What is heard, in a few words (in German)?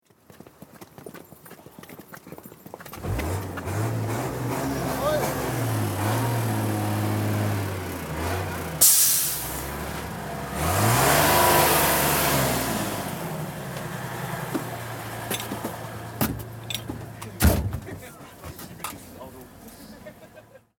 Einsatzfahrzeug anschieben